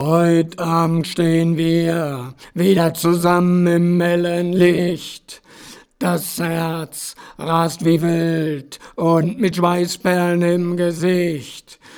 chor tief halb rechts

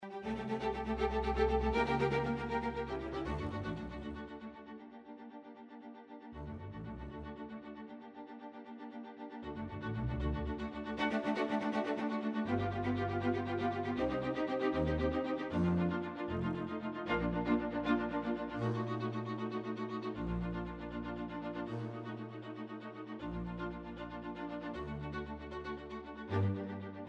streicher quantisierung

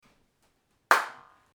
clap geschlossen

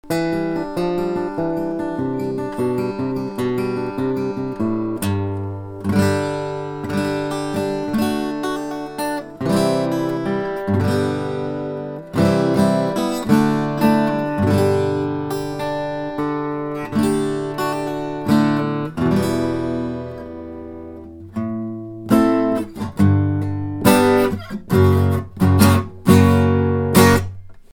rechte Schulter, Westerngitarrenaufnahme

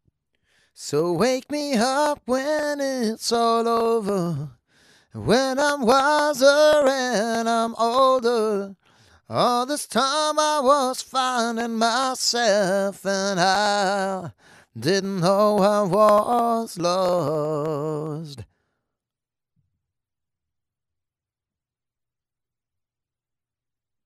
ohne great river preamp; opheus preamp

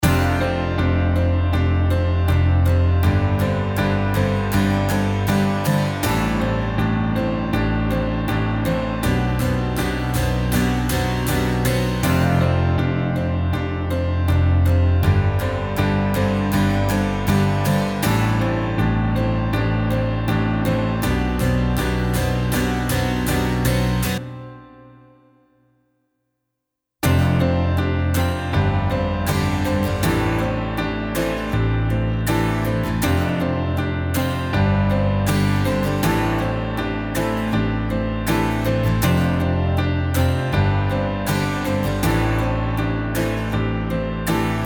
gitarre, rock pop ballade

